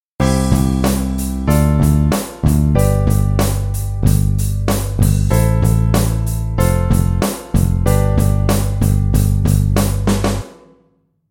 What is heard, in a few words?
drum bass chord